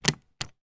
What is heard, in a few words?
office stapler stapling paper; sound effects one